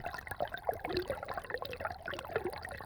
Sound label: Waterway E Dark